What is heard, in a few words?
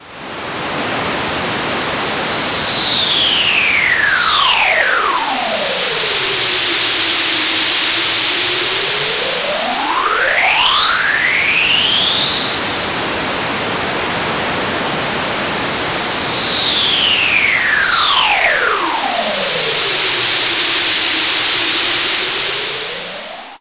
SFX, synth atmos & fx; Dream Zone